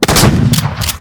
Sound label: shotgun shot